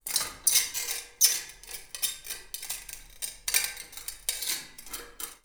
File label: Glass Scrape; 99Sounds; The Warehouse